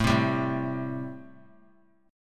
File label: A-Minor 7th A; 21,19,22,19; Am7 strummed; JGuitar